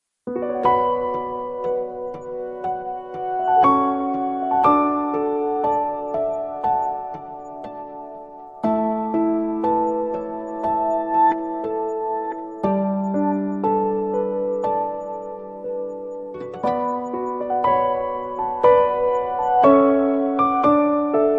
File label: Instrumental